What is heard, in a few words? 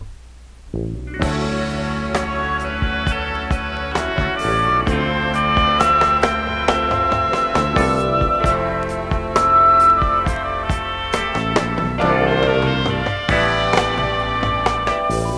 shaker